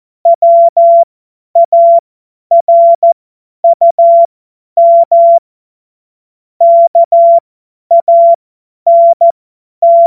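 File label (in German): Witz, cw morsen; Morsekurs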